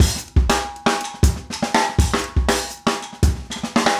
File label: break 120